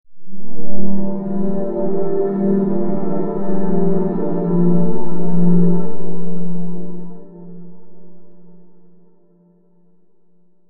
Unnatural sax

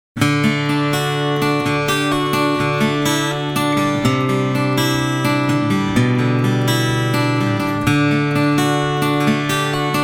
Picking Hole

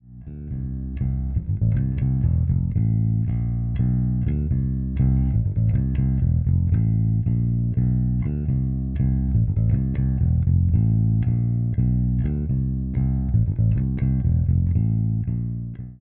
Zoom B2 Four Fliptop

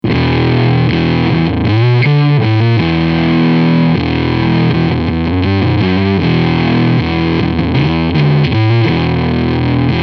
Small Combo

